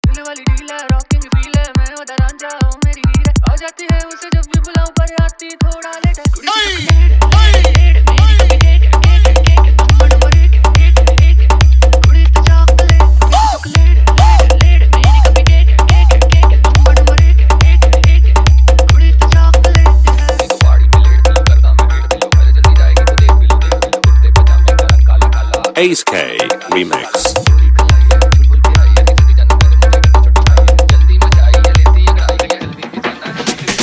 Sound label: Bollywood New Hindi Dance Block Mix Remix